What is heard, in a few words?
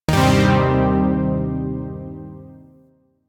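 Shawzin Pentatonic Minor Chord; Chord B; Chords; Warframe; Zariman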